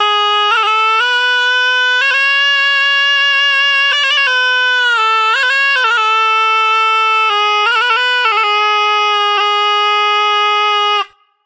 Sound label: narrow reedy ethno melody; WORLD